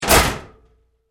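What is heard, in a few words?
Door Locker Close Close Up Hard, Metal Door; Metal Doors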